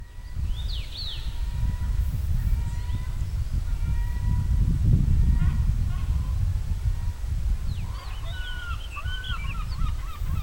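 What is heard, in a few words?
Monticola saxatilis song plus backgrounds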